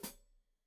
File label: Hat Foot, Hi-Hat, Cymbals Stereo